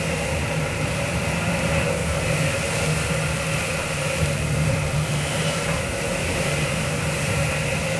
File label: scrape smooth, metal barrel; physics; props